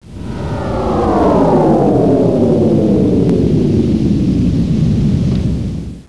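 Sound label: power down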